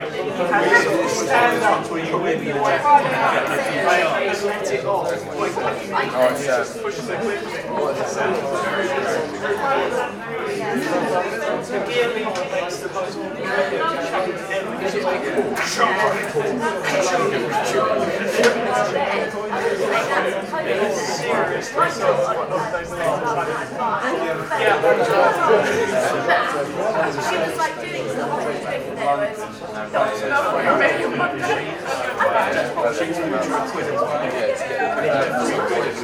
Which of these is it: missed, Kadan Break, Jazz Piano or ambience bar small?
ambience bar small